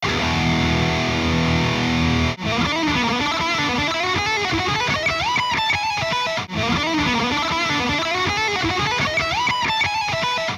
shredding; metal man

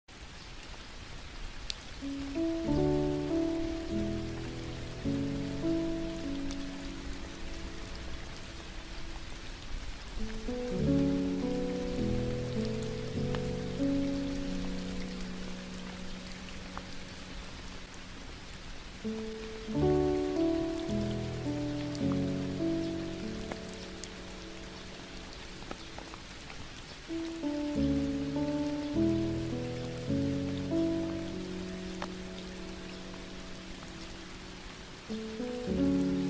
rain and music

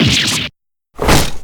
damage

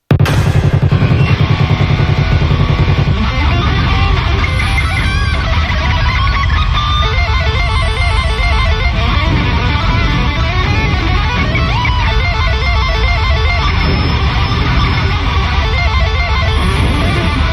breakdown

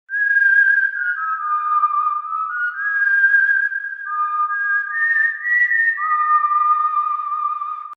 kite whistle

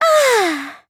sigh; sayaka

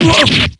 super damage; kanji

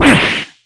block; jubei

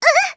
eek; celica

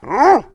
awoof; ken and koromaru; p4u

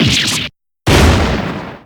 damage; filch

